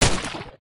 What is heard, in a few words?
Nutcracker Hit Eye